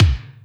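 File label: kick Plated, Alesis D4 drum machine; 300 Drum Machines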